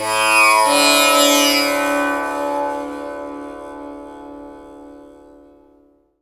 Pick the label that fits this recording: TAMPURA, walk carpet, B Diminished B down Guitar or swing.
TAMPURA